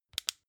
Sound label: flashlight off fast